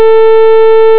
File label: sine